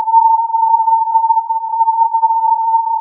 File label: filtered input